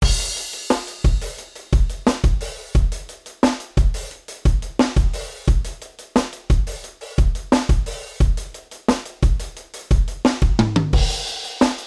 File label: modal surf, drums